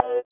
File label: SITAR; Spectrasonics; Metamorphosis; INDIATEK